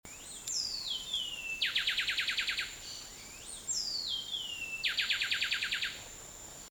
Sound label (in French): route kaw trog cora chant